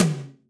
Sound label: Mid Tom